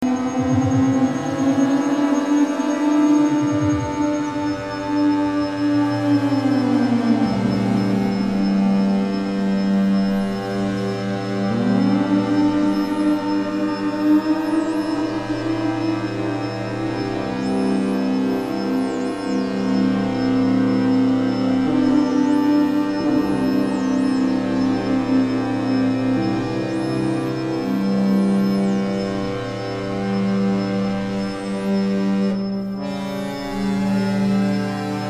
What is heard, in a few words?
string thing drone